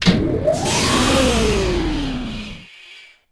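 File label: door open; Shadow Man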